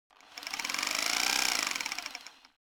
construction industrial rock drill chisel short fast